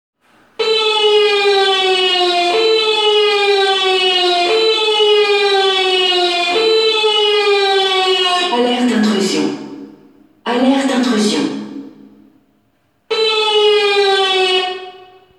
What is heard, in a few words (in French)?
alerte intrusion